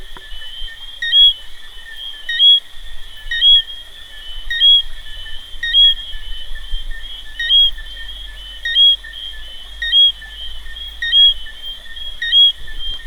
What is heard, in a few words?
Eleutherodactylus johnstonei; Dewynter